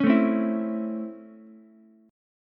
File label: Db Minor Major 7th Sharp 5th Db Ukulele; 6,9,8,7; strummed